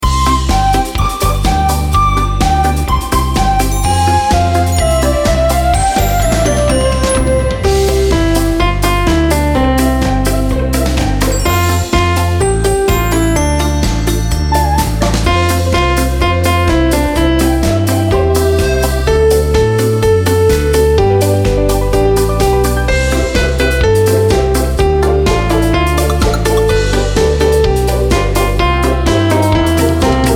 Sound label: karaoke